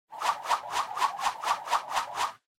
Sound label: Motion Swish Mid Short